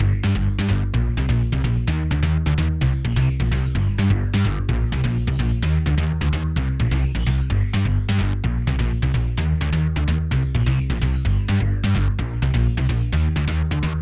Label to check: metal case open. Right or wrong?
Wrong